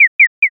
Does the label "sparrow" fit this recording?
Yes